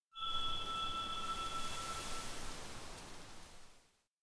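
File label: electronic faint grasswhistle wind; anemoi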